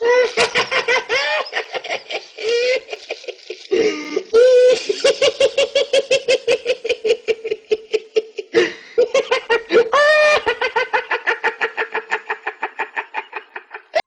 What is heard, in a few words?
laugh old wheezy; ooh ahh